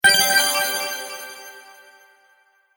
Sparkle Collect Win, video game sound effects

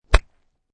Golf Ball Hit; Sports; Golf ball hit 2. Golf stroke, short echo from nearby wall. Good definition hit.

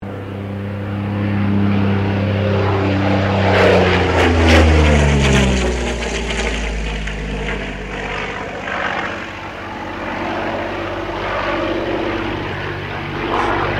AIRCRAFT P-40 KITTYHAWK LOOP STEREO; P-40 Kittyhawk / Warhawk / Tomahawk; Airplanes; Vehicles

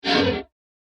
Industrial Whoosh

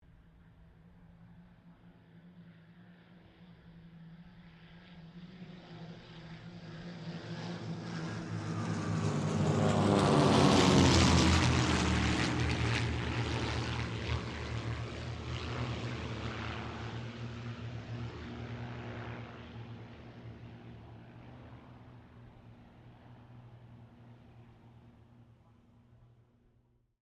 AIRCRAFT CT4 SQUADRON 5 PASS, Squadron of 5 CT4s; Airplane; Vehicles; Aircraft Collection

